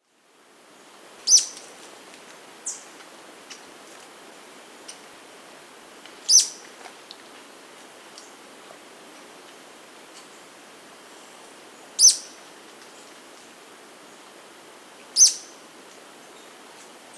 Inca Flycatcher (Leptopogon Taczanowskii), Andean Birds; S. America